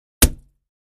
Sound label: Axe Paneling 1 Side, axe hits